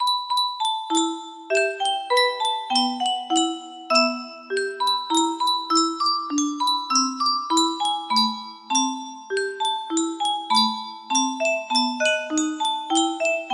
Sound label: C 30 notes, music box melody